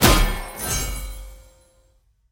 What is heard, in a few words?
scatter Lvl 2; conan